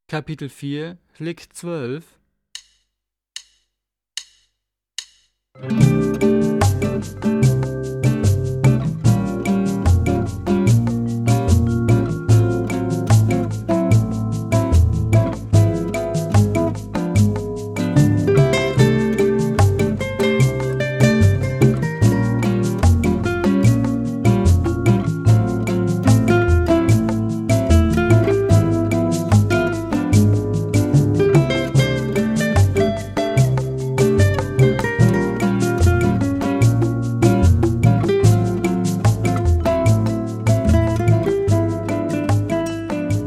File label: Latin Style Guitar